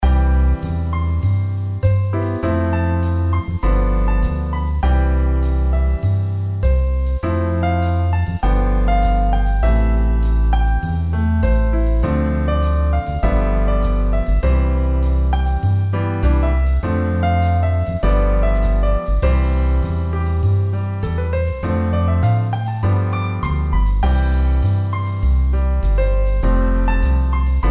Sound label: Warm And Jazzy